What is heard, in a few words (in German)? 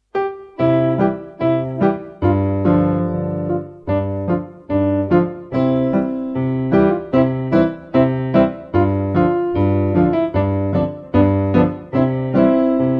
Kuckuck Korrigiert